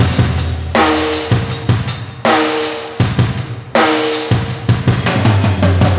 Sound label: Brute C, ambient; ambient vol 2